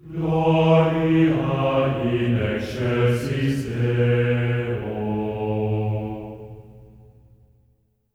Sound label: GLORIA A3, Symphony of Voices; Spectrasonics; GR GLORIA